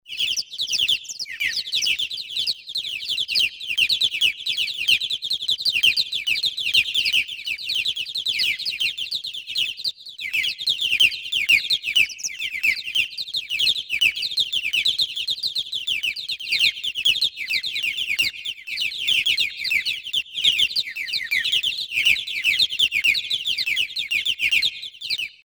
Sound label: digital birds